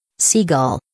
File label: seagull, wild birds